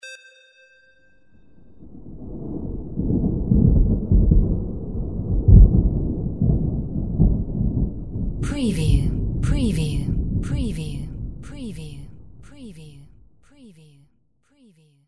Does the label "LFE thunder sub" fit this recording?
Yes